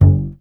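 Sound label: string contrabass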